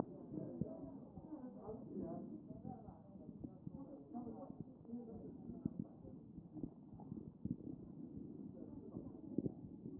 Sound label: CHEST